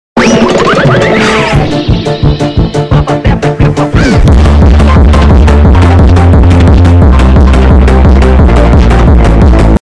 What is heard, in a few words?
phonk